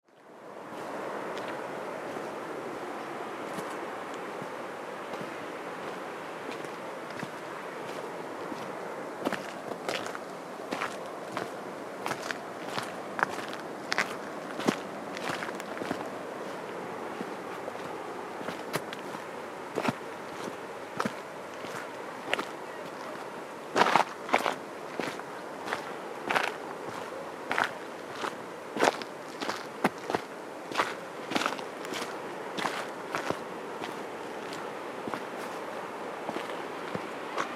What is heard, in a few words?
Sound of the Sea